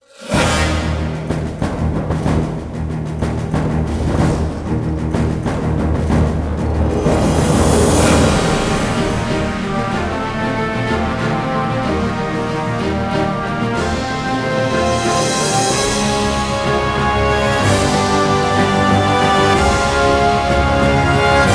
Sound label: Victory; starlancer